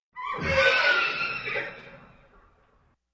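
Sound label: neighing in the stall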